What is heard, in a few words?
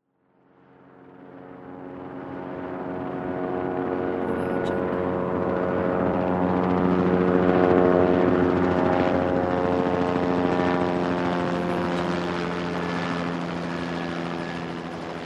helicopter pass